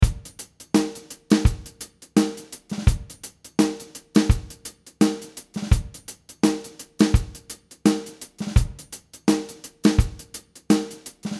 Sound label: Drum Small Room